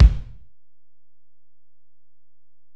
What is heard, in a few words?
Ac BD, Alesis DM-PRO; 300 Drum Machines